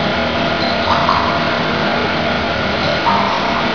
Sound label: hum drip, rain; nature; hl2